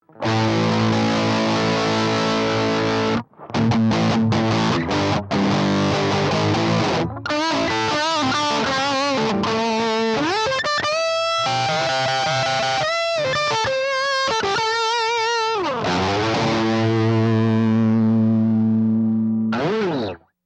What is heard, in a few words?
gigmax dist; greco